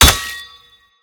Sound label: basic impact; smite; thanatos